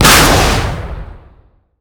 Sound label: Weapons Missile Launch; DCS